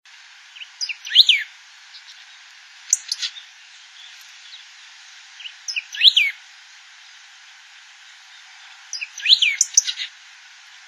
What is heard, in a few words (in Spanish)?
pepitero de collar y juvenil